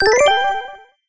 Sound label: Loot Pickup; lego ninjago rise of the snakes